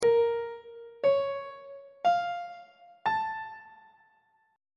b flat melodic minor 7th chord 1 is b flat minor major 7th on treble clef